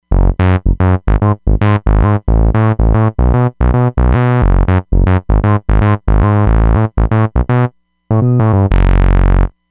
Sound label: Glide, Roland Fantom XR; Glide-iator